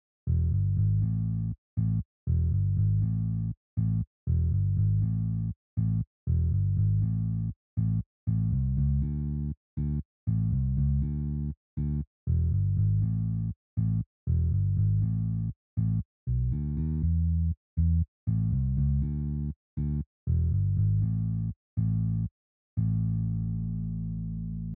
bass line, bass; Beginning Bass

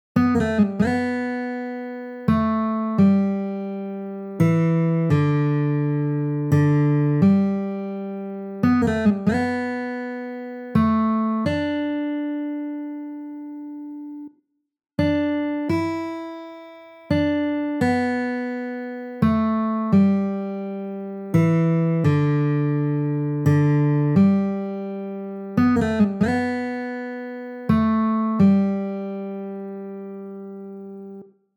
Amazing Grace Melody, guitar

